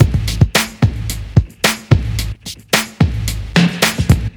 Noisy

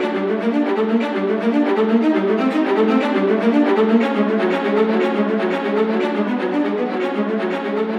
viola; виола

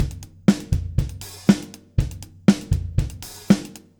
Beat; Jazz (Джаз)

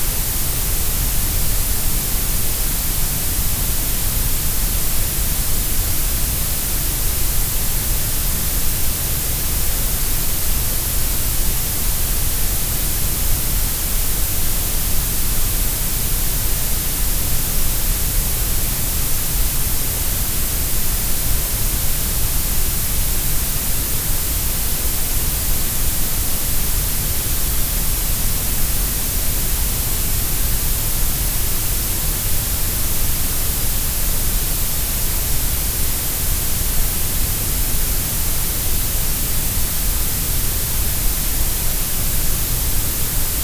Grey Noise

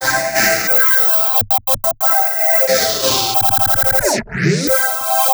FX, пэды

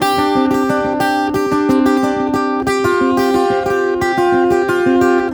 Riverbed B, guitar

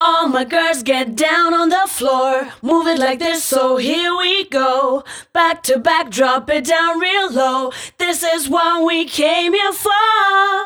All my Girls, женский вокал

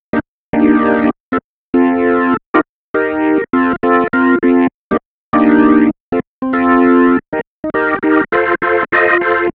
Break Organ

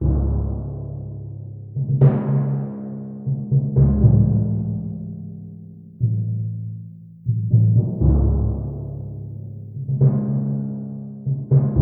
timpani

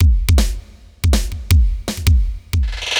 Drum N Bass Beat; аудио-сэмпл для саундтрека

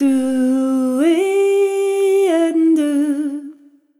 Ballad

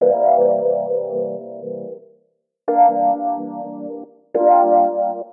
Wah E Piano; electro piano

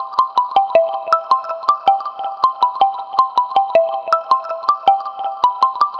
Wooden, mallet эмбиент; сэмплы

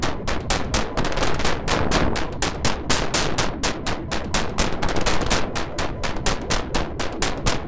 Barrels FX

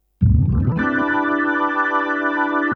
Slide A, джазовый сэмпл keys; jazz

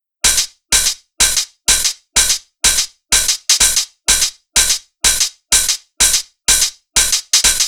Zippel Beat, dance

